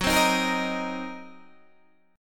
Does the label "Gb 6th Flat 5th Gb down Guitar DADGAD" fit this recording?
Yes